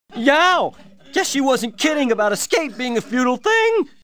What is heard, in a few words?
Yeow